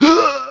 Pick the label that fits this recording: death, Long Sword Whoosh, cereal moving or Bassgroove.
death